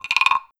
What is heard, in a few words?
Perc Wood Frog